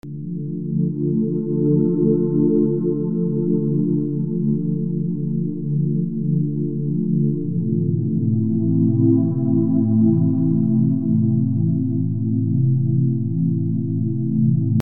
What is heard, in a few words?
Pad, Soft Squares